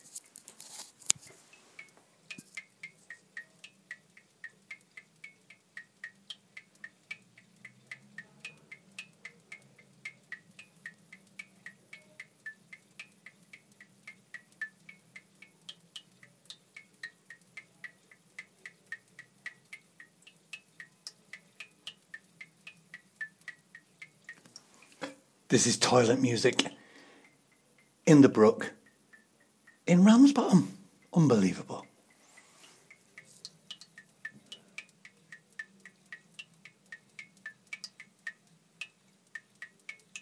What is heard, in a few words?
toilet music